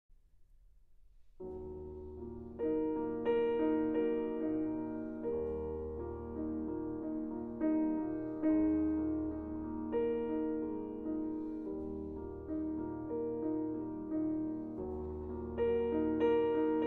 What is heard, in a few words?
Cello Sonata in G Minor